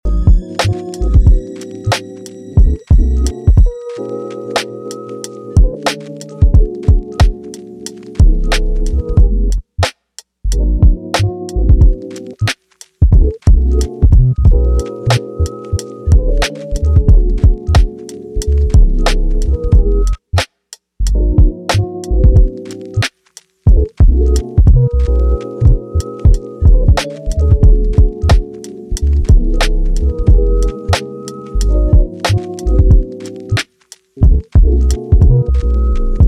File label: No instrumental